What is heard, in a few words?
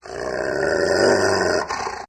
Dogs Large Dog Growls